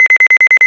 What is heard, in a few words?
beepers